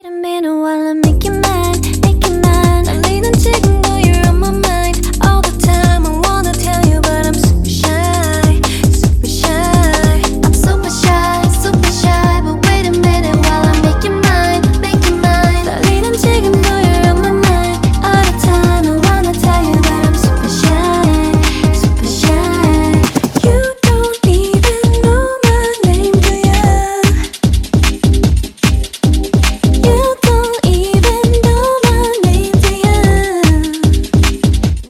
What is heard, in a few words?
KOREAN RINGTONE